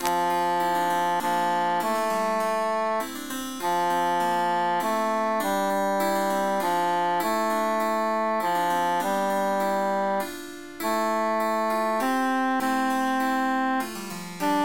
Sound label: bassus emphasized; aucun se sont